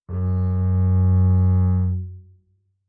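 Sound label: Bass arco fortissimo sul E Ab2, Double Bass; MIS Pitches - 2014; Strings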